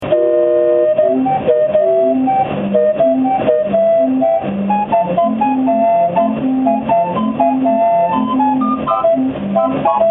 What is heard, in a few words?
calliope; spirit of peoria